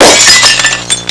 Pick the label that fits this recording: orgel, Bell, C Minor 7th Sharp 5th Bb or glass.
glass